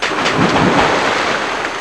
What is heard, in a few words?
water big splash; GAMECUBE; SUPERMARIOSUNSHINE